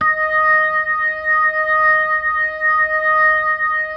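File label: E flat 5, B3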